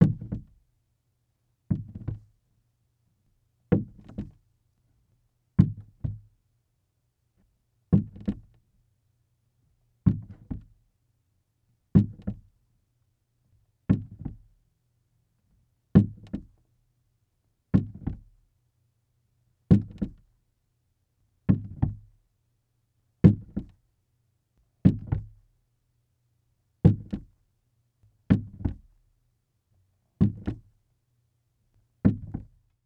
Foley Footstep Golf Cleats Slow Walking On Wood Platform; Foley Footsteps